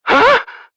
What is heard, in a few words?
huh; gonta; alter ego